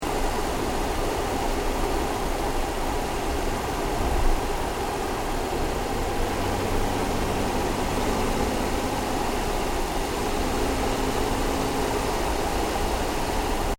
CAR NISSAN 350Z ENGINE BAY CRUISE 60KPH LOOP, manual sportscar